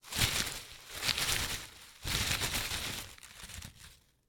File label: book gasagasa; anemoi